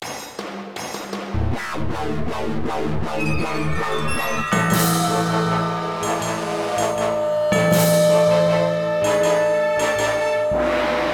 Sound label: Action START; bolt 2008 pc gamerip